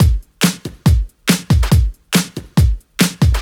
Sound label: Breakbeat 140 BPM; Vengeance Packs; vengeance essential club sounds-3; VEC3 Loops 140 BPM; VEC3 Breakbeats 140 BPM